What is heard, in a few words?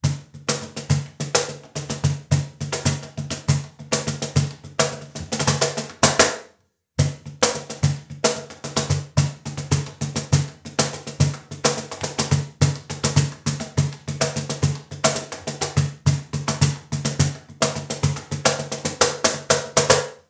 cajon